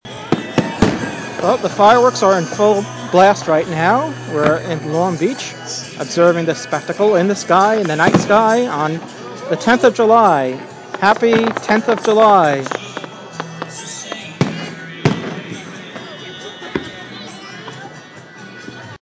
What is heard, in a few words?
fireworks in long beach